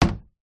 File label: household window old wooden close queenslander australia; zapsplat